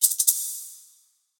Dry, notifications; Gigaset GS290

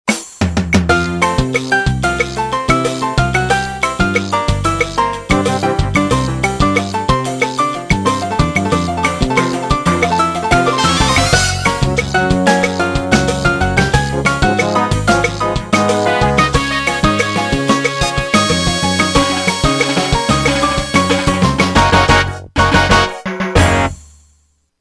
Rumbic Cuban; Yamaha SMAF demo ringtones

